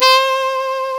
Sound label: E4 sax, soft sax; East West - Global Sounds